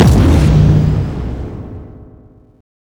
biomech air blast hit

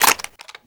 Winchester reload loop, foley; Call of Duty Modern Warfare

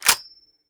m1a slider down; Escape from Tarkov

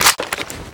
reload clip out, foley; mp9

